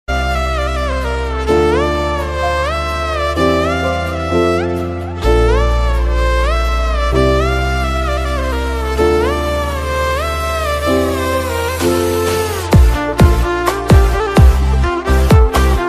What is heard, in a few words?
tamil love ringtone; caron tunes